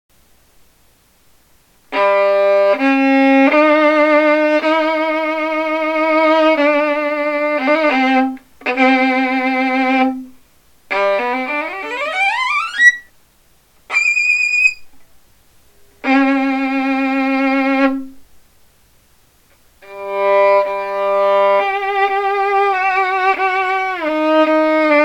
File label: Violinist, violin solo; An Excerpt